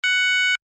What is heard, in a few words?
alarm tone, sounder alarm tone; sounder beacon